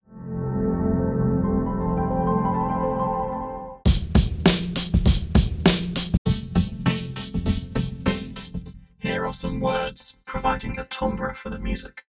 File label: Vocoder drums voice texture